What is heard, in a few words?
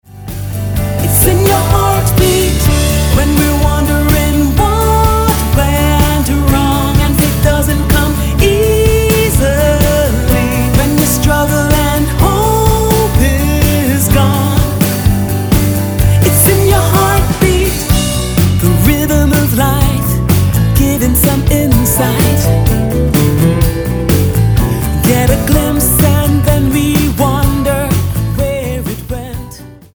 Heartbeat